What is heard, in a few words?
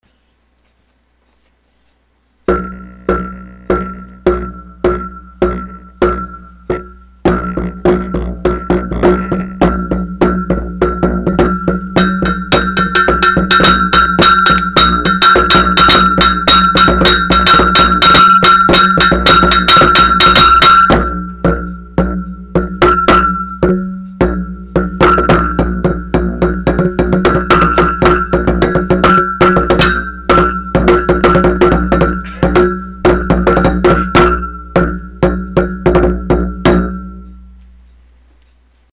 Pipe Rock; LizardAlert